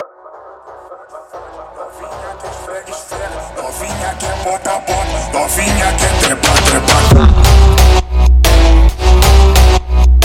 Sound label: Phonk Music; فانک phonk